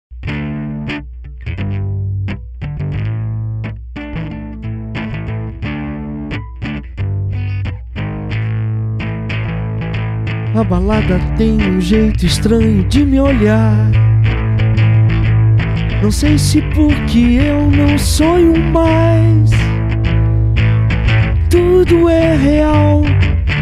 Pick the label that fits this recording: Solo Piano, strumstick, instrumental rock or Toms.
instrumental rock